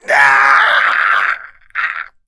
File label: Male Death; ragemap2025; fournines